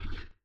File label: snarl